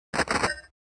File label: Retro Weapon Reload